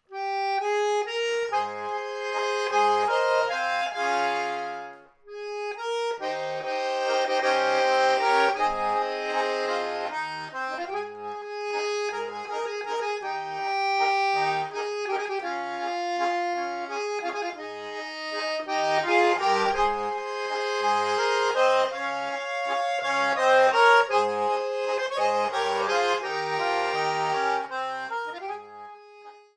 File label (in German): schneeflocken tanz; oergelinoten